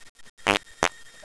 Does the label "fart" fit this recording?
Yes